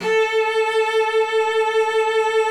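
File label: A4, cello vibrato; Xsample VOL-7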